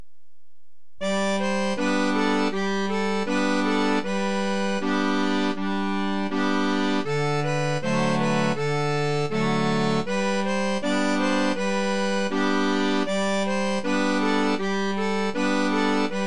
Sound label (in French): la scottish; Chopin; du pere Bertrand